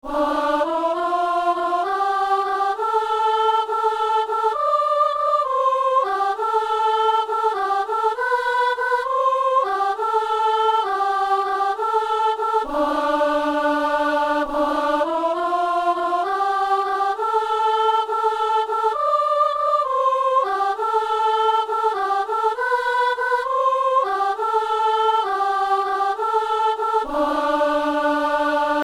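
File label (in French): anamnèse, soprano; Messe coutrillonne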